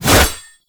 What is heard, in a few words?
Bayonet Melee Whoosh; Warframe; Tenno